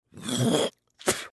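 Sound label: human spitting sounds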